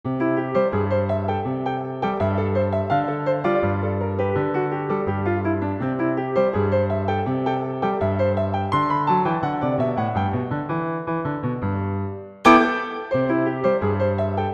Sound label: Surprise Samba; Haydn; Pianoteq